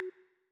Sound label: no pointer anchor, ChromeVox Earcons Reverb